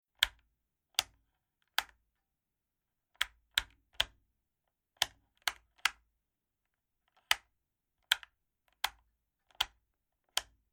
Domestic House Light Switches Set Of Three On And Off